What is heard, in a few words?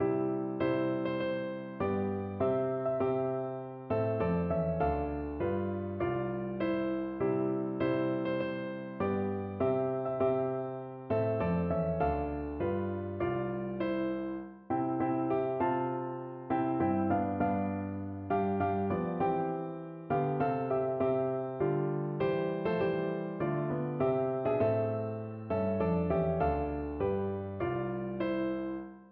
piano duet